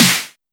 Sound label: snare